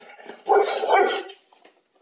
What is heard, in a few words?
dog woof